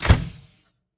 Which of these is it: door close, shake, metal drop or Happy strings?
door close